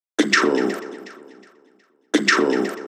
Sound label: D Vox Control